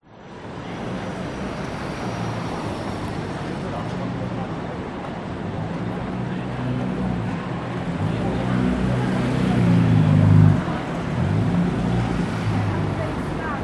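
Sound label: public places city street ambience night 8pm people traffic pass close, Sydney; george st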